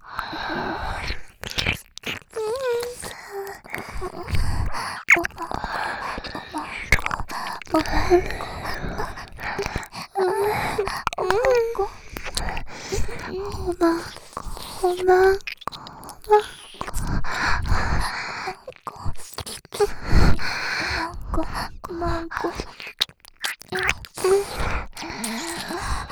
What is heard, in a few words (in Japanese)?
耳舐め 喘ぎ; お屋敷メイドのハーレム淫語ヘヴン 気が済むまで耳舐め淫語を堪能できるバイノーラルオナサポ音声